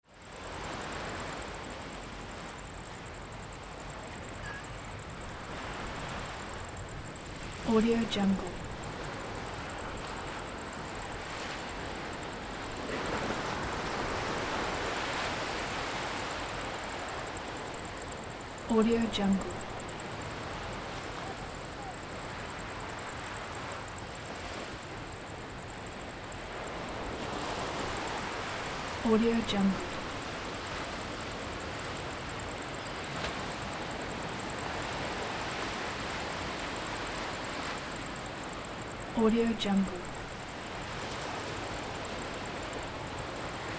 stormy sea waves; savataivanov

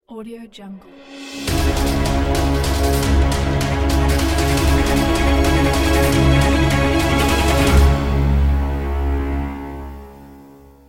intense action intro logo

